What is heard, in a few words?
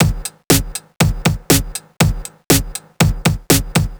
120 Drums; Zero-G; Soundclash Nu Dance Electro; Acidized Wavs; Combi Loops; 120bpm; Rock Da Funk